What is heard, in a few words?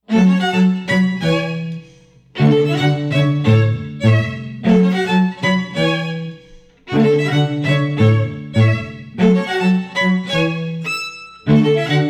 Strings; Caprice Strings